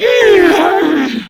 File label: Death, Female; Warframe; Grineer Female; Battle Barks